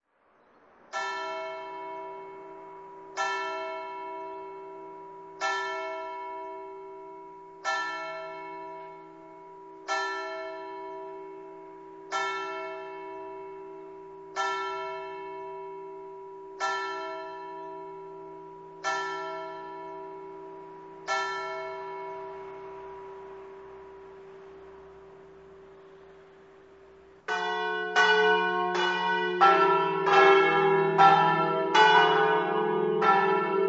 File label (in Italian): coderno, campane